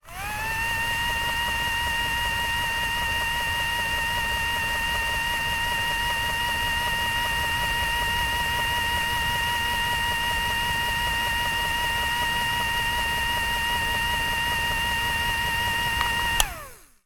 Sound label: industrial motor machine small plastic whirr long off